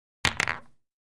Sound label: Billiards Ball Drop